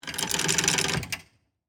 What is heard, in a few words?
Chain Pulley Pull, Industry, Chain, Pulley, Pull; Factory Sound Effects; Factories